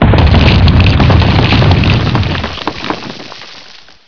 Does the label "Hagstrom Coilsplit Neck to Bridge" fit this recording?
No